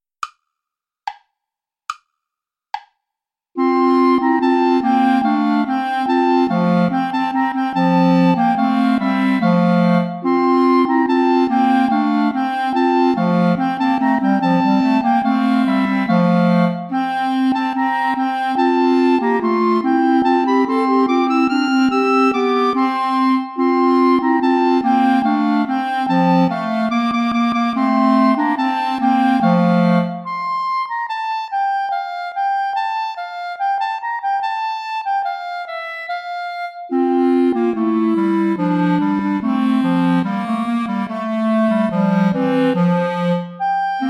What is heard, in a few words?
play along without clarinet; traditional